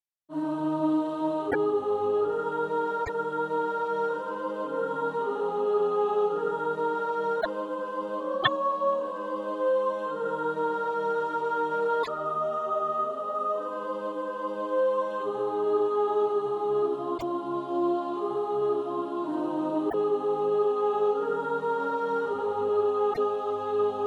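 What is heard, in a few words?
soprano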